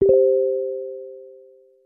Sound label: notification; Swiftgram; TelegramUI; in-app sounds